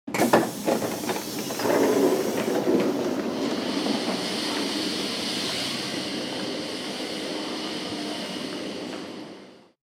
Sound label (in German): S Bahn Tuer oeffnet